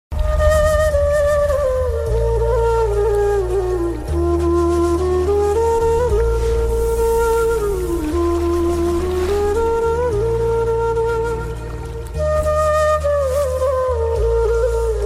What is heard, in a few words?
bgm ringtone flute bgm ringtone call ringtone